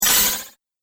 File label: Droid Electro Move, robot; Robot Sound Effects